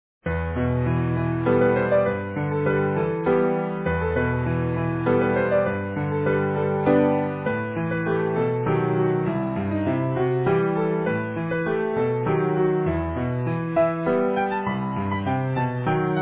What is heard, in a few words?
Easy Piano